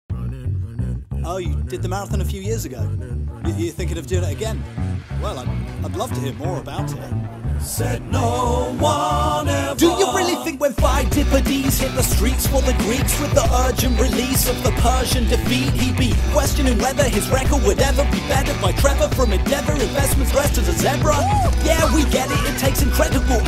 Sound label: rap music; london marathon; the rap up